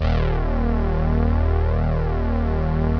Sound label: Bass